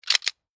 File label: Weapon Pistol Hand Gun Reload Old Austrian Steyr 1914; Pistol Sound Effects